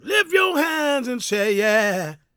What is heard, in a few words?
GOSP MALE, Vocal Gospel; Vocal Planet Vol1 Gospel